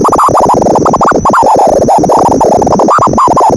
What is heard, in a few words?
HELLS BUBBLES; Zero-G Ambient 1; EXTREMESTUFF